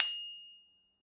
ding soft, dings

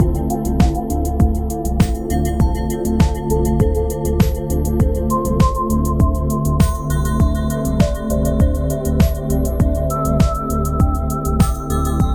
iron fields loop

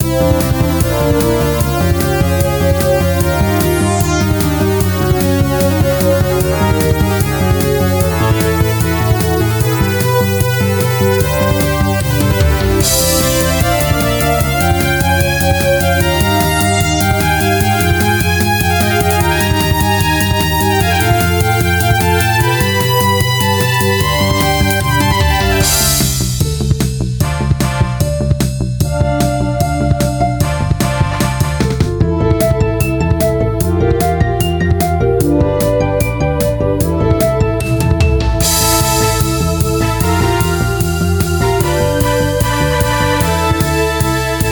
Electronic Fantasy

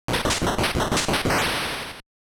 warlock exploding; 8-bit Platformer SFX

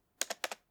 keyboard typing

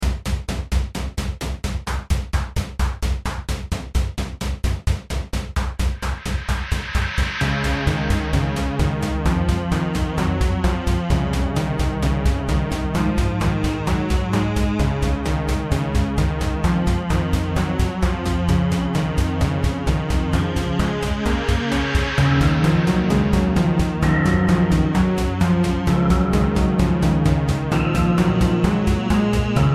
battle slow

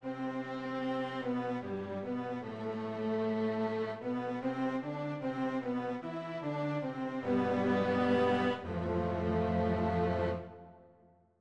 lose music

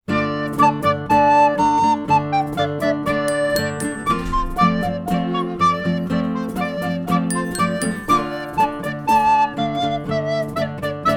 Recorder Jam

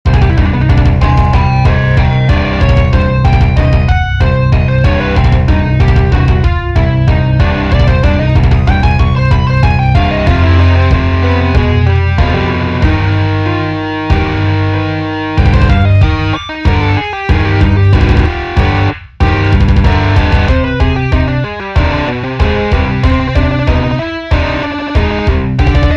Metal Band (Chromatic)

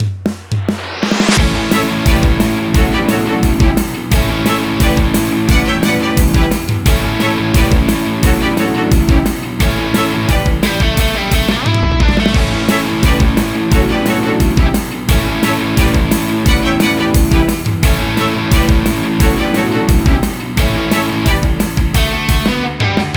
bravery knight bpm175

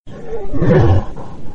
wolf monster

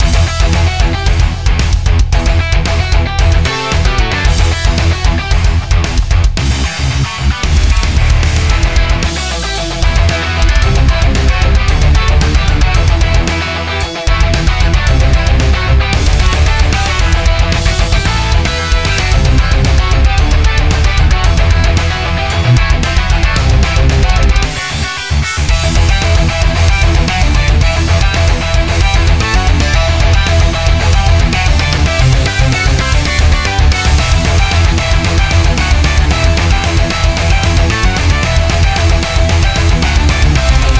boss battle metal loop

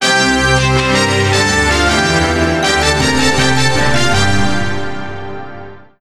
jingle

